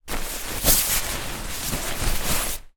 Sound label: foley sleeping bag movements